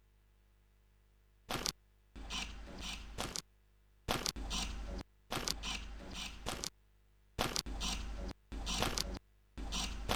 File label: stereo 2instr loop